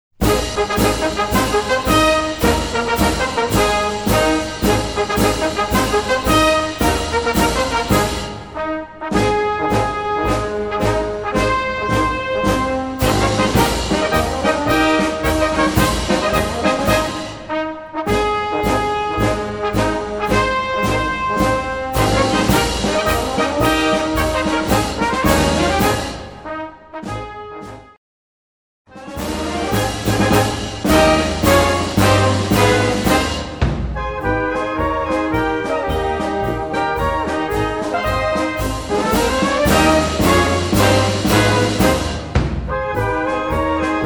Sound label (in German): Marsch